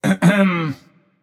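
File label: nonverbal clear throat; smite; voicepack; merlin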